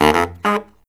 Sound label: Killer Brass Riffs